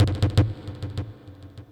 TOM INDST 140, Industrial Drum

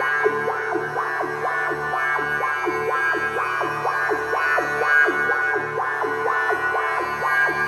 ethno, 125, A

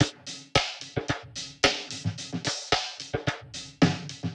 110 Cosmic Tapes, Warped Live Drums; Loops; Touch Loops; CosmicFunk

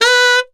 Tenor Sax Portato B3; DirectWave; Varazdin Orchestral

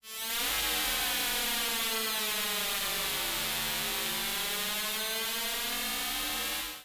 SPACE FX 140; SpaceSounds

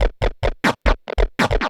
Industrial 140

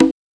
CONGA

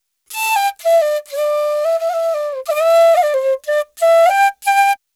ETHNO EXTRA 90; ethno2

